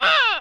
女受伤; renlei

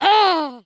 女人嘿哈声; renlei

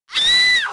女人尖叫; renlei

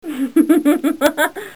女孩笑声; renlei

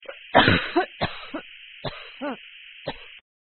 女生 咳嗽; renlei

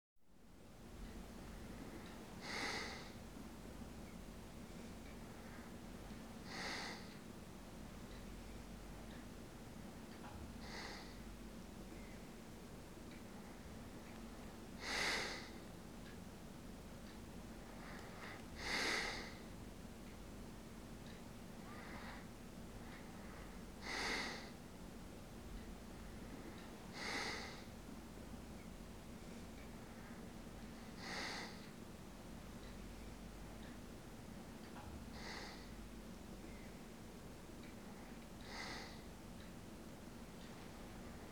睡眠(呼气)

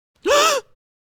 抽泣

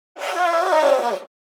擤鼻涕

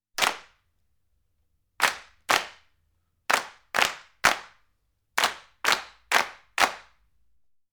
拍手(次、多人)

